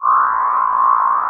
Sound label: SWAMP, SCI FI; AKAI Anime Sound FX